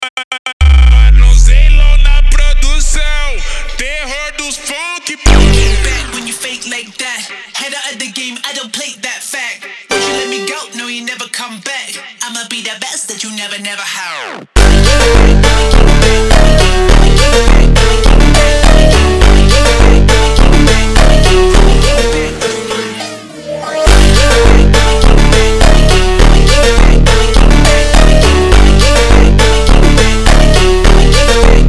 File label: Phonk MONTAGEM Slowed